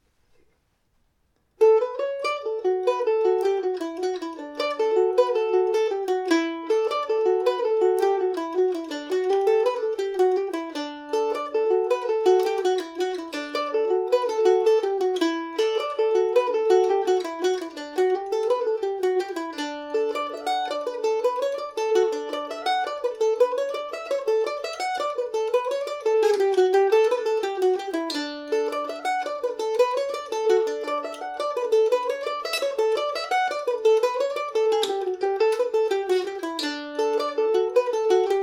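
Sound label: D major, mandolin; Kentucky KM1000 mandolin; The Singing Cement Mixer; Listen to me playing the tune on my Kentucky KM1000 mandolin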